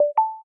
Charge Connected

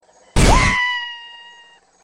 aaaa, hip hop ringtone

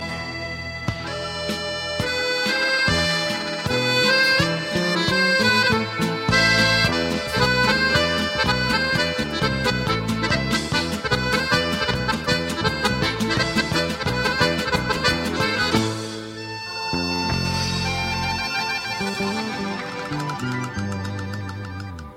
Kalinka Instrumental

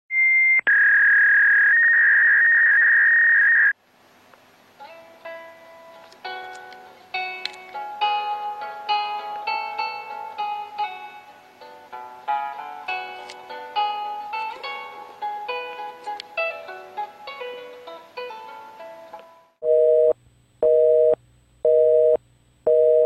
Nokia Tune on iPhone

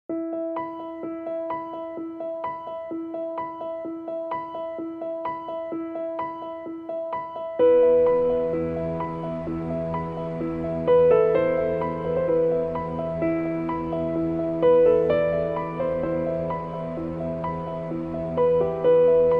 piano; alternative

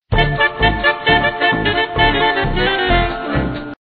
disco

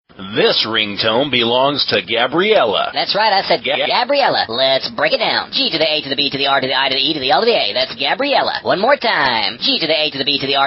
Acoustic

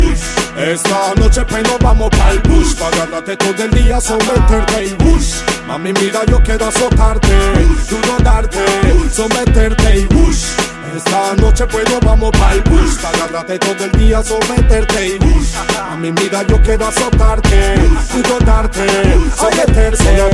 panama reggae

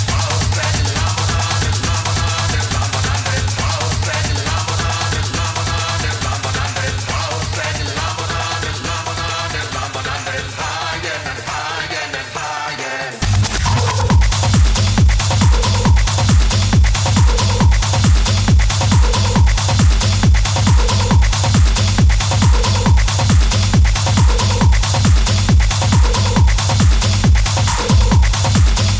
Samba

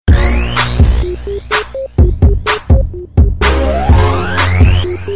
RnB Tone

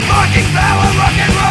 viking power sms

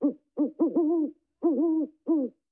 bird calling

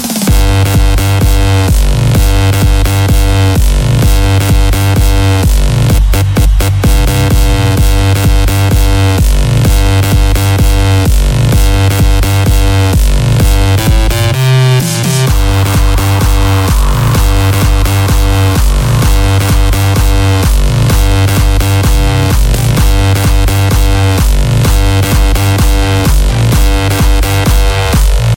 Bass

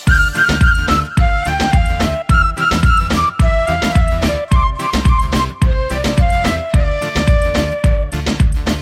Flute Bhangra

